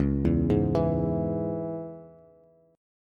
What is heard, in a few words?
Db Minor Db down Bass; arpeggiated